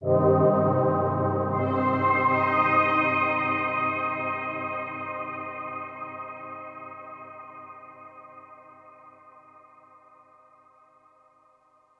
SYNTH PAD AMBNT 160 C, Ambient; eJay; Sphere

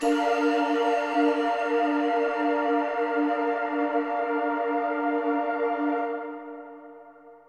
SYNTH PAD AMBIENT 160 C, Ambient; eJay; Sphere

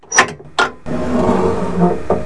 safe open; KABOOM! - Wild and Wacky Sound Software; industry; equipmnt

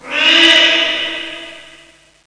CAT

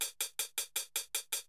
hihat, Drum+Bass, 160

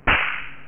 Smack; WhackIt! Weenie Edition

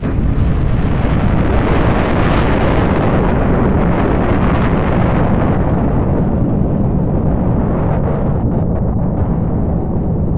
NUKE; Machines

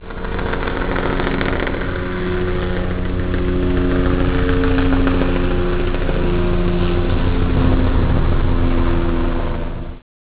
helicpt

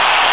crowd; SOMC Family Forum

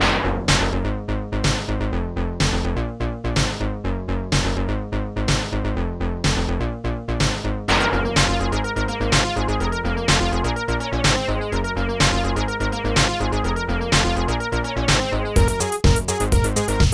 musak

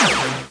shot; AGGRESS; 501 Great Games - Volume One; Guildhall Leisure Services

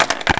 dice

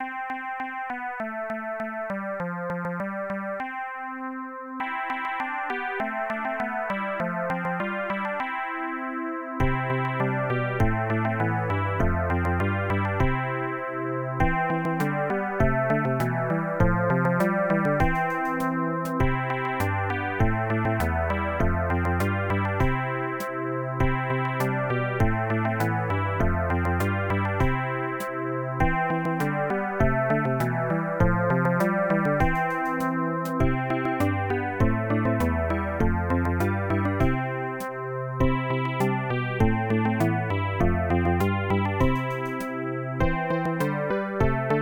DINGDONG

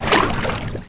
wade

